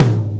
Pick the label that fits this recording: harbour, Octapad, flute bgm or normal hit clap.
normal hit clap